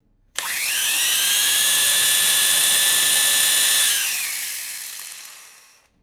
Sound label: small handheld fan spinning up and down; machines